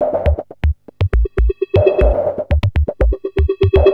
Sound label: Beats, Industrial